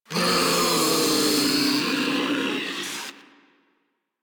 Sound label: Proto Infested Ancient Death, Enemies Vocals